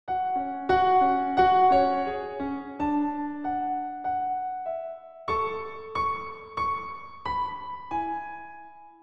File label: piano